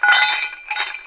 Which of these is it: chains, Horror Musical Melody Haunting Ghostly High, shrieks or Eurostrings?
chains